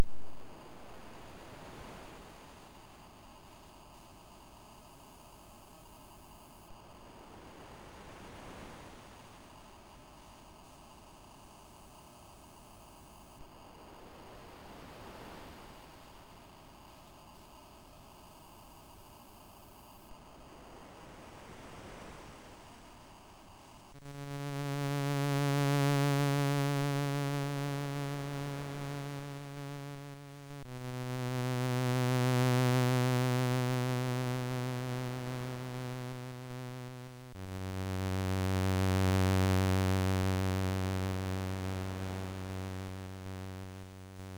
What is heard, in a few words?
Mechanical Waves, MOS6581R2